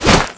bomb c4 pickup

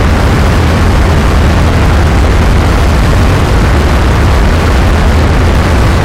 F-15 afterburner, aircraft; DCS

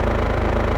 mg loop echo

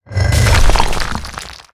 objects, World of Tanks

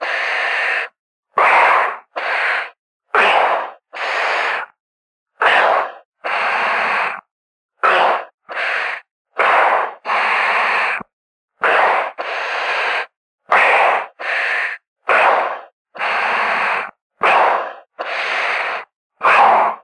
Aircrafts Cockpits G Breath; DCS

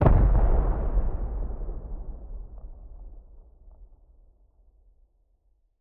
rocket explosion distant; Rust

